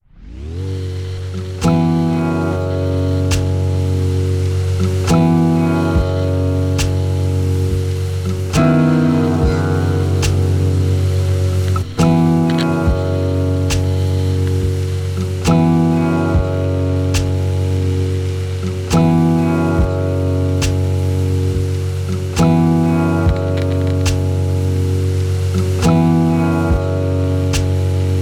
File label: mind noise